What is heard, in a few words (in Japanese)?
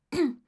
sekibarai; serifu others